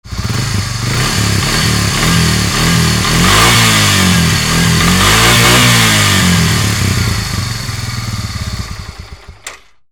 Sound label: VEHICLES